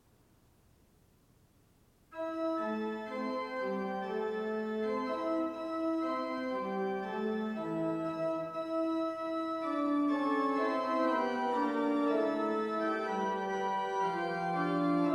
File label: Fugue, organ; Margaret Kvamme